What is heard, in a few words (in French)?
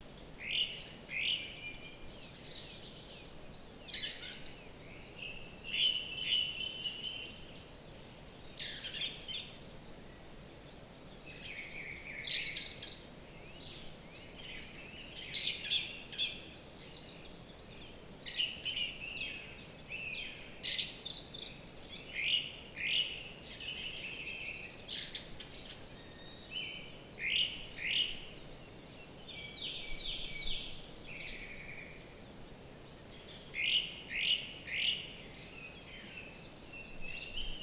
Merle à plastron; Issaux